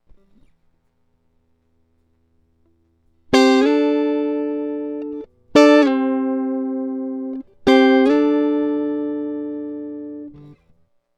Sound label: e. gtr